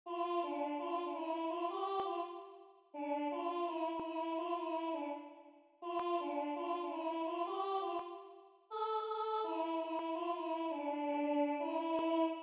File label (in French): Alto, chorale